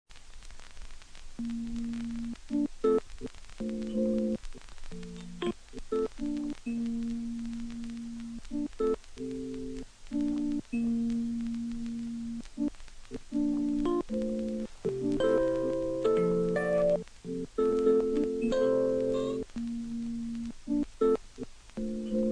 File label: fireworks